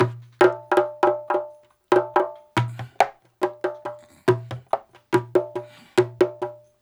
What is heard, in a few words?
130 BONGO1; Drumdrops In Dub VOL-1; PERC LOOPS; 130-140 BPM